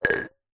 household glass coffee cup thick empty set down upside down tiled floor; zapsplat